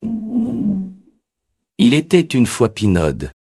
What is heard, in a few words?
jingle